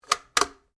RIFLE ANSCHUTZ 67MSR 22 CALIBRE BOLT ACTION CLOSED; A selection of domesttic firearms; Rifles